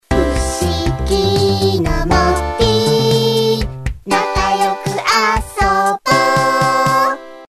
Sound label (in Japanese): イメージソング